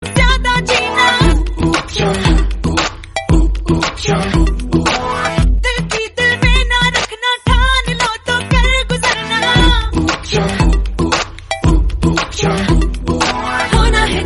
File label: srilankan ringtone